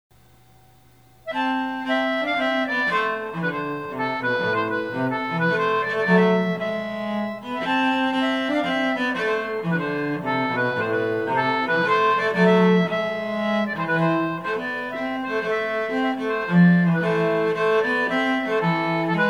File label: Swedish, concertina